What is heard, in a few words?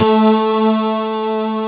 LED, S-LEAD; AKAI S-Series CD-ROM Sound Library VOL-8